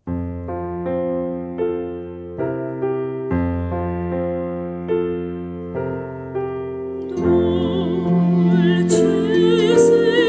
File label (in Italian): vl arpa e pf